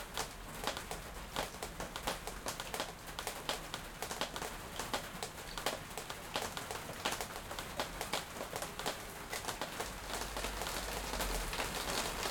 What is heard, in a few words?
Soft rain, water flowing down from the roof and splashes on cement, city ambience, loop; Sound Ex Machina